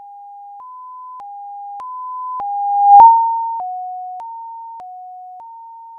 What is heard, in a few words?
Ambulance